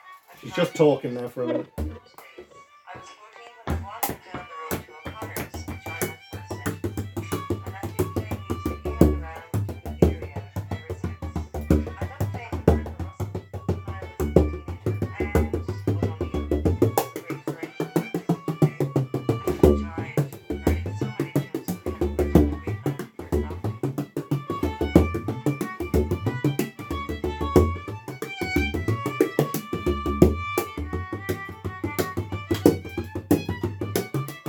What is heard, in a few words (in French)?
Impro joueur de bodhran